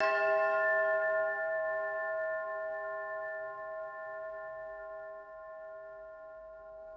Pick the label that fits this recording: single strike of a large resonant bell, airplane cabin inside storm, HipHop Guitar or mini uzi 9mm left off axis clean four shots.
single strike of a large resonant bell